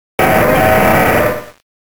Grito

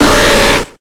Grito de Slowbro

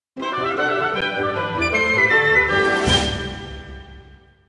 Tema de victoria; Kirby y Rey Dedede; SSBB

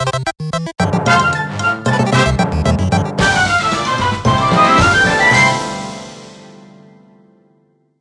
Tema de victoria; Duck Hunt; SSB4

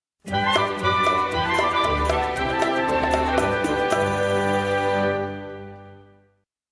Tema de victoria; Donkey Kong; SSBB

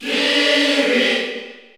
Grito del público (Español); Kirby; SSBU

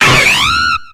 Grito de Prinplup

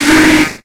Grito de Gligar

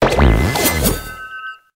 Grito de Ceruledge

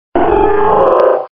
Grito

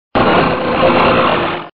Grito de Tropius

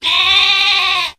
Grito de Wooloo

